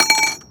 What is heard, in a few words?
Moody Park Chimes Perc; Houston; percussion